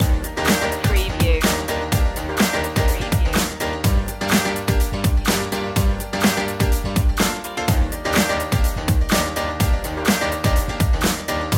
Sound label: summer drops loop